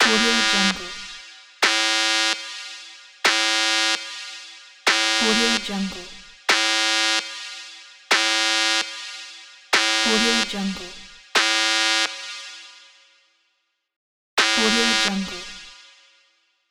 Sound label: alarm